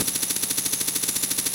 Hookshot Ambient

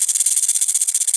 amb, Spinner

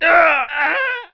death, nali

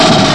Firing

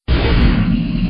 Idle Start

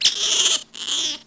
hurt, Spinner

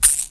Bite, Spinner

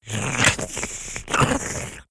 goblin attack

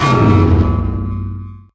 Mag Amp Bolt

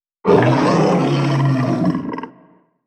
Doggie Cat Growl; Unreal; Firestorm 2014

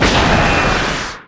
Rocket Shot, Rocket Launcher

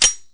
grapple mode

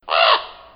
raven sound

